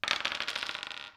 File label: dice